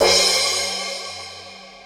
crash sound c sharp key

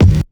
dry steel kick drum g key

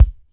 perfect kick drum sample a key; kick drum samples

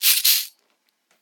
shaker percussion c key

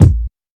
old school low kick drum one shot b key

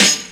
clear acoustic snare sound g sharp key

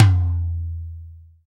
verby tom e key; tom drum; tom drum samples

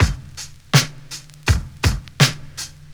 82 bpm 2000s drum groove f sharp key

